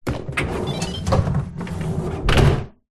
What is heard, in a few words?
household dishwasher door drawer slide open beep then close; zapsplat